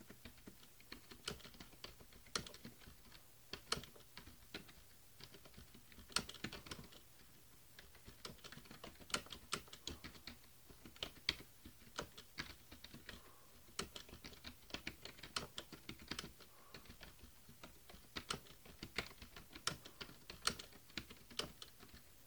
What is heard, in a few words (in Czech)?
Dell enhanced, klávesnic; Dell Enhanced Multimedia Keyboard